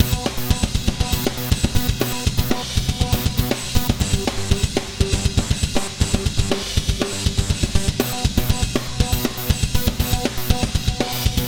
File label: Metal Attack Loop